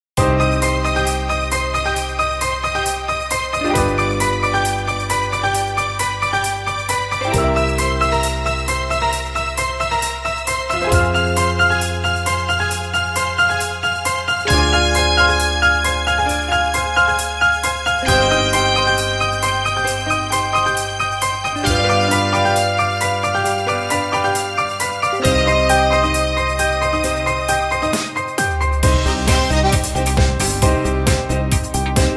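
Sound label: minus C minor alto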